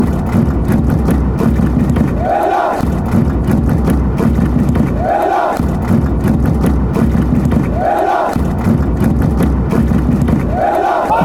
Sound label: chants, greece; world cup